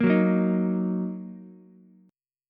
A sharp Suspended 4th Sharp 5th A sharp down Ukulele; strummed; JGuitar